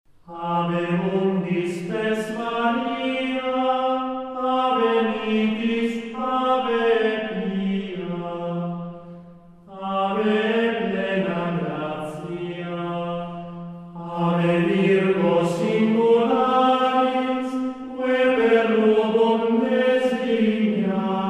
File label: Regina Coeli Jingle; Papai Angelus